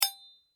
impact metal small; Materials

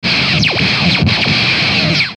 static frequency; Static Frequency Oscillation; Production Element Imaging Element Accent Transition; Blastwave

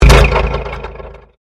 Convolution Pulse; Sound elements to create more complex sound effects; Swell Sound Effects; Sound Design

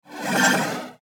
Rollover Synth Whoosh; Multimedia; Blastwave

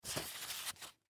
Manual Open Turn Page Book Pamphlet; Office; Materials; Blastwave

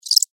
Cricket Chirp, Single, Insect

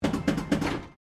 PINBALL MODERN BUMPER, Modern pinball table; Arcades

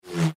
Bullet Fly By Fast Whoosh; Warfare; Whooshes; Blastwave

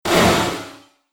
Synthetic Crash Sound, impact

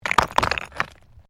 STONES MOVE

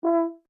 HORN E5 MP SHORT, French Horn in F; Alexander French Horn in F; Horns; Musical

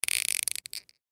Chalk Box Squeeze Squeak Short; Playground Sound Effects; FOLEY

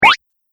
Arcade Game Lilypad Jump; Video Game Sound Effects; Retro Inspired Sound Effects - Think Nintendo, Atari, C64

